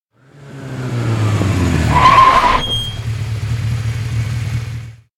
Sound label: bike hard brake; anemoi